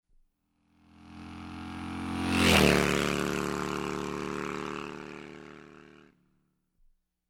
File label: Cruiser Motorcycle Passing by